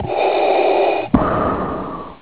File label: vader breath